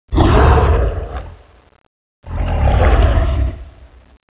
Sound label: groggy flinch, kraken; CSO KrakenBoss